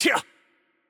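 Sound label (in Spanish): Hurt Light, Mexican Spanish; es MX; VO; bloom minigame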